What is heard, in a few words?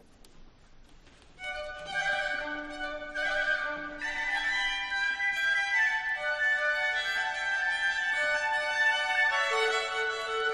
Rossignuols Rondeau; Jean-Ferry Rebel; Les Elements simphonie nouvelle; air pour lamour